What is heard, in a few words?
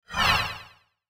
Sci Fi Energy Whoosh Fast; Laser; Blastwave